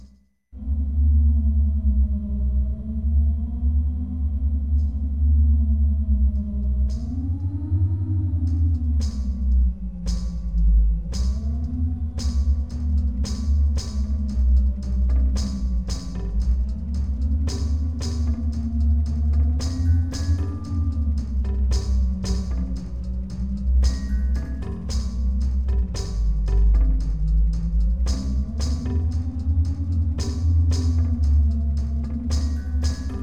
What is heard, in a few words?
Fire Temple